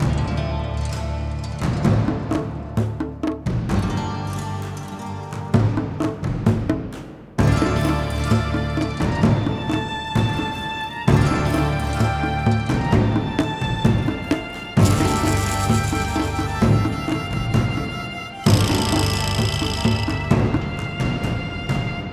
Menu Music; Dusty Raging Fist

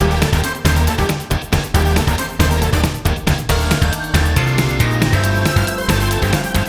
Music Race Full; Souldiers; Xbox Series X|S

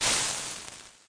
Rocket In Water; cut the rope time travel; android

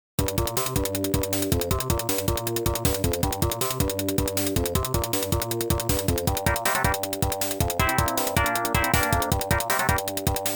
Bike Chase Scene ~ Roaring V-Model Engine; NEKKETSU KOUHA KUNIO KUN; Legend of Game Music 2 Platinum Box

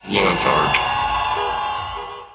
crowd

